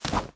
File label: step